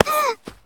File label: Male Hit By Pitch Baseball